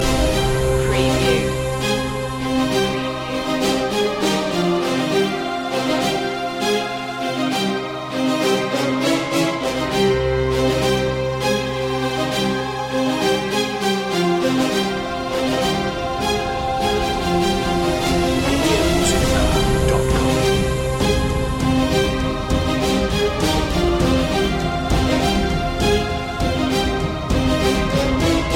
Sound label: epic warfare